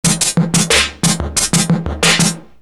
808 reverbed, eq to midrange; esoteric

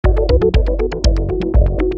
cruisin highway 303; esoteric

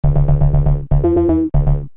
rubberband bass; esoteric

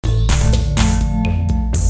80s electronic pop dark wave; esoteric

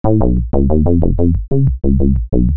cool filtered dread, basslines; esoteric

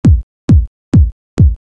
4-4 bass thump only, drumloops; esoteric

